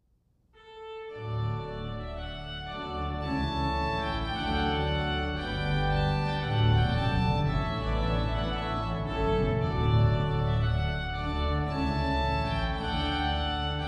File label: prelude; charpentier